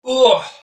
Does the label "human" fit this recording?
Yes